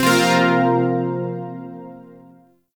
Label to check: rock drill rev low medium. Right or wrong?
Wrong